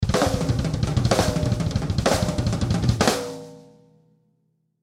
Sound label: Drum Fill Double, Drum Fill Double Bass Toms; Drum Kits; Blastwave